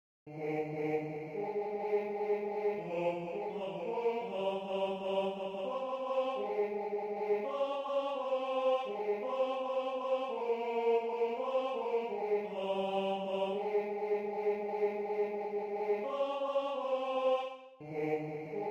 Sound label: Tenor